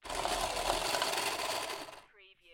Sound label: Playing Cards Automatic Cards Shuffler Run